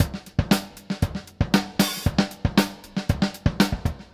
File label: Beat Loops, 116; Zero-G - Monster Beats